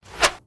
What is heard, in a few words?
medieval scythian recurve arrow heavy pass by